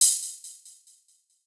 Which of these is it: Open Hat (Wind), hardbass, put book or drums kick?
Open Hat (Wind)